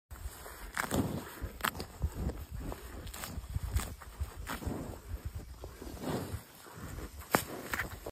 Cow eating grass; علف خوردن گاو

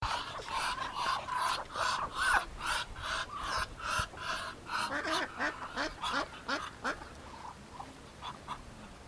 Muscovy duck; اردک مسکویی

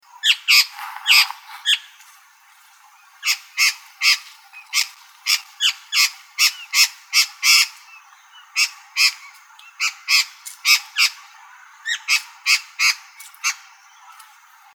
Babax lanceolatus; پرنده باباکس چینی

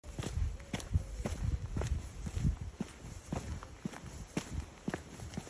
walking with sneaker

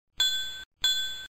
Crystal; کریستال

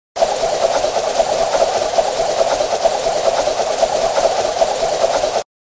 spinning top

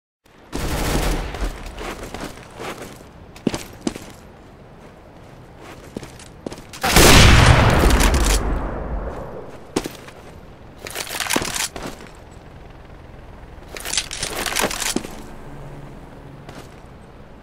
counter strike walk

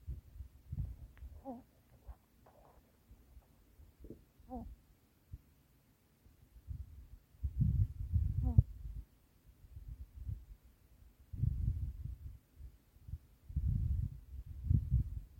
Houbara; هوبره

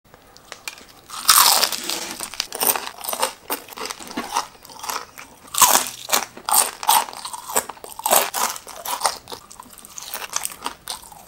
eat fried chicken

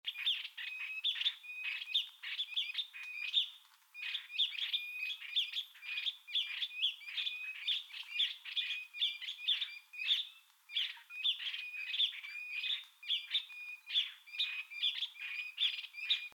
Dusky fulvetta

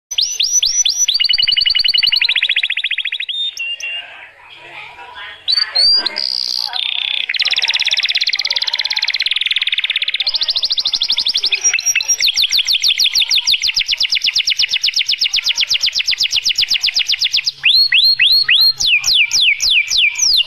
female canary

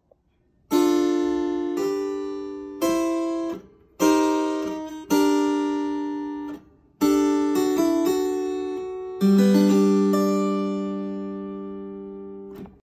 Harpsichord

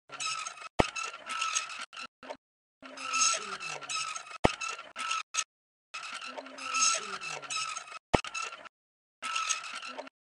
bolt and nut; پیچ و مهره

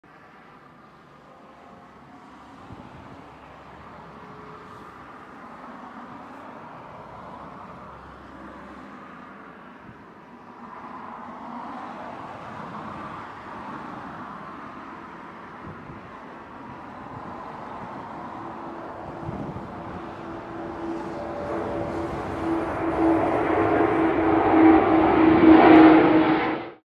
Antonov-An-124, آنتونوف 124

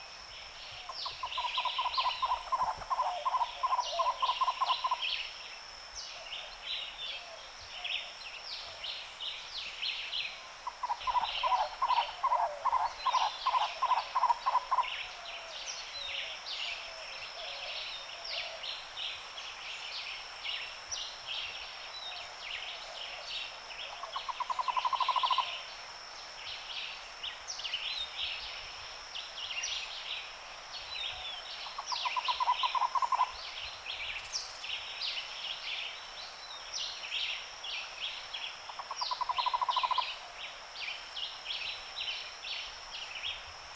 Cuban trogon

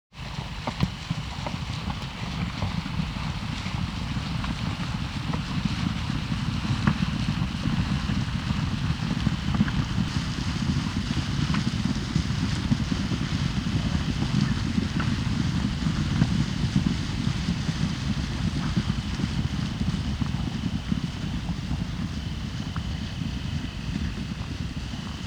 Zebra Herd Running

